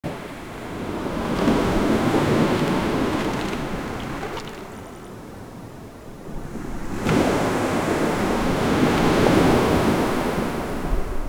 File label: the sound of waves crashi